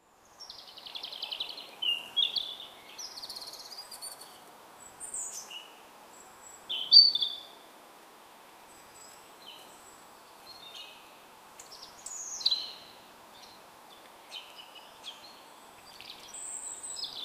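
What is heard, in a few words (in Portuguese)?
pássaros cantando em uma f; singing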